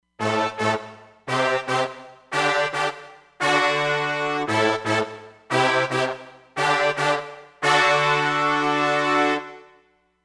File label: StackTp Sect, Roland Fantom XR